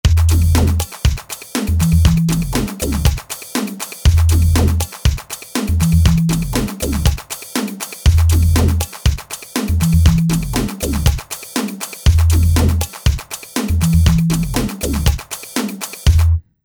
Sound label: House